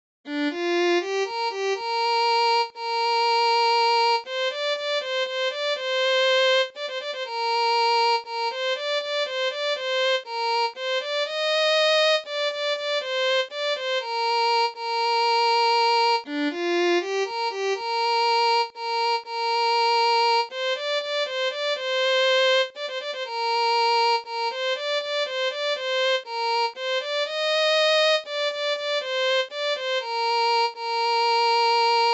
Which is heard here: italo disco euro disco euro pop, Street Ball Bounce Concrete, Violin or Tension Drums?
Violin